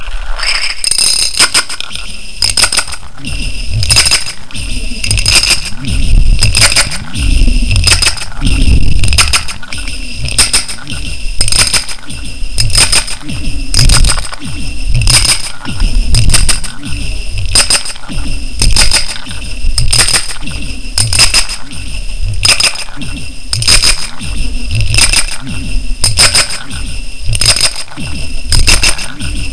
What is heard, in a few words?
ringtone